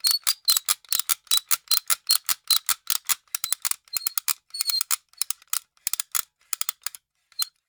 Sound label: Gadget, Motors & Switches; 1001 Sound Effects